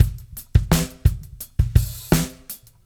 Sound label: Beat Loops; Zero-G; Monster Beats